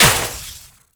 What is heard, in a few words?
Infested Radio Tower Speaker Damage; Warframe; Ambience; NineteenNinetyNine